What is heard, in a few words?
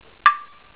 clave